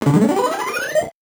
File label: VocoBit Noise, 8-bit; musicradar; 8-bit bonanza